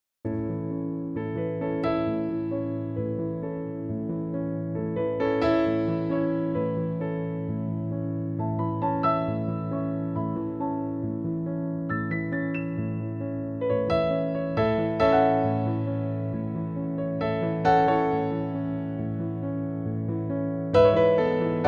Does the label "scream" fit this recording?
No